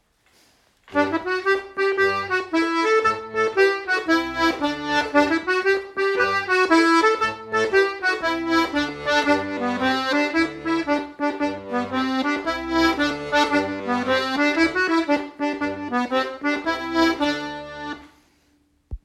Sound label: diato; La Bolangèra